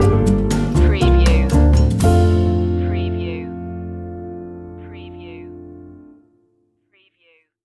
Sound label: auld lang syne jazzy stinger